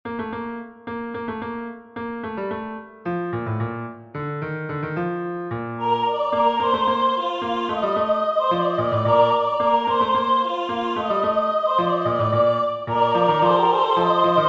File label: Magnificat, Esurientes, TUTTI; Vivaldi